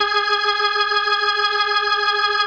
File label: B3, First Call Vintage Keyboards